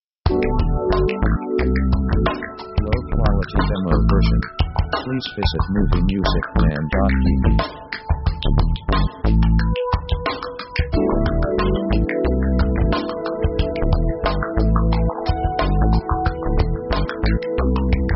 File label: pop cool background; contemplative themes